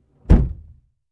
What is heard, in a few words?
Closing Trunk